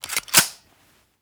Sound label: thompson bolt action; Rust